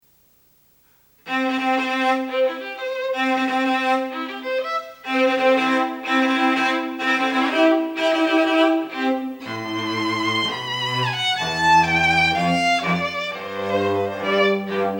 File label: Contemporary String Ensemble; Wedding March